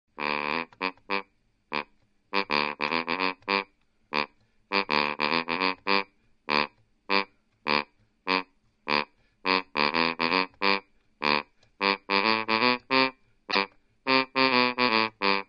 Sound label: bari sax